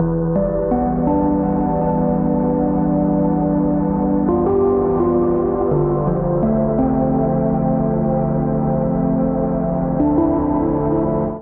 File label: C Major 84 bpm; MIDI Clips; Tonal; Accompaniment; Arctic Shadows; Drive and Glow; Ableton; Factory Packs